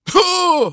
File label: h pain